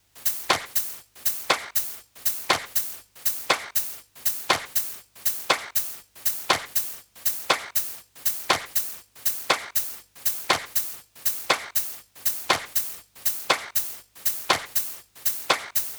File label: Hihat Clap House 120 bpm; Loops; Drums; Top; Ableton Live 12 Lite; Core Library